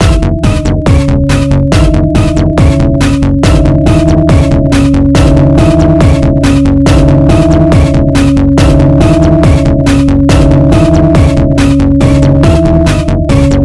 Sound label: piano remix song